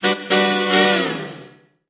Music Effect Jazz, jazz

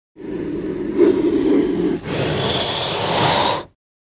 Cobra, Indian Hiss. Close Perspective.; snakes cobra; Cobra Breath Close

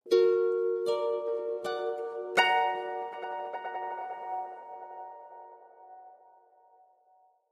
Harp Arpeggio Reverb; strings harp

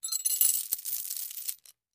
Med Chain Lowered

